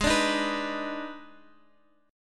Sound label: Eb Major 7th Suspended 4th Sharp 5th Ab